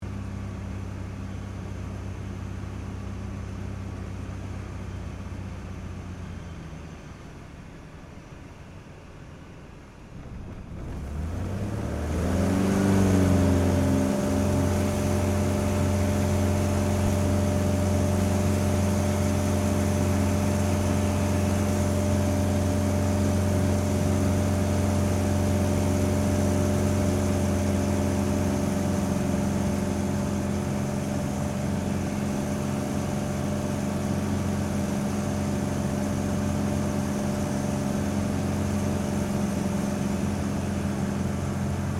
Aircraft CT4A Touch And Go Interior, RAAF CT4 training aircraft